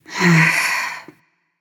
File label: nv sigh; smite; awilix